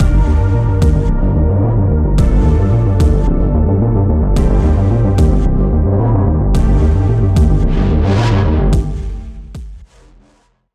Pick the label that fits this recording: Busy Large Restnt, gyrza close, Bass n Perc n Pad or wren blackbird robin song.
Bass n Perc n Pad